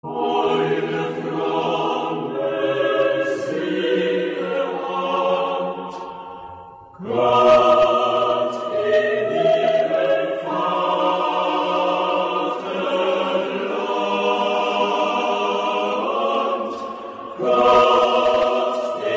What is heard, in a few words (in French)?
Hymne national suisse